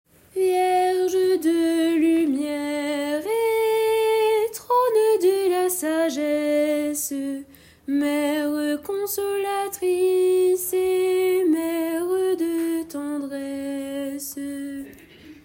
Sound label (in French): Chant du Jubile Couplet Alto; sanctuaire